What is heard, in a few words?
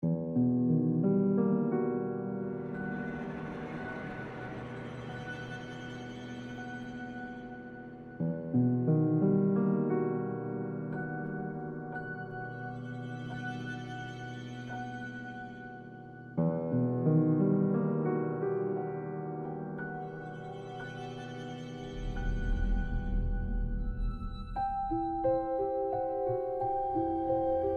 Twelve Tone